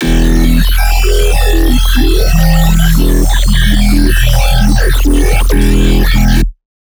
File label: E Laser Growl 4 Bars, Dubstep Bass; Ghosthack; Advent Calendar 2017